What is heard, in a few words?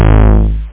c64 bass; 8,363 sample rate; 0.09 seconds; Amiga 8-bit Sampled Voice